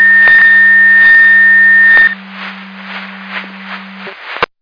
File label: Siren; Hamburg FD